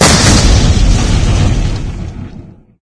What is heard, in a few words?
explode; svencoop